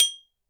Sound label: bottle, handy percussion